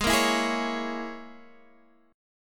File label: C sharp Minor 6th G sharp